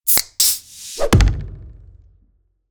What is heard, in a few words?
Audio Logo; Spearhead